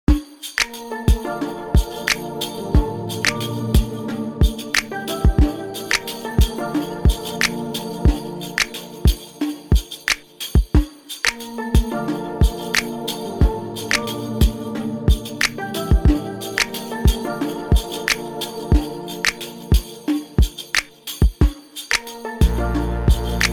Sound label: Afro Beat